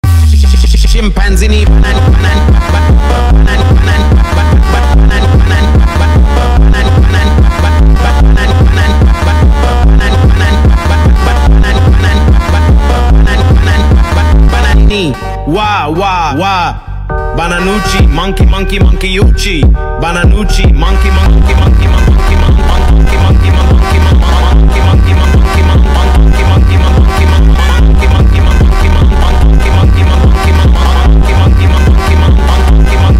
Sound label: FUNK